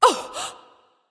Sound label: surprise; smite; arachne